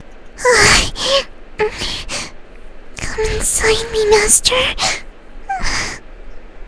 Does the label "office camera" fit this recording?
No